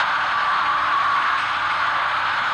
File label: solar panel rotate; Code7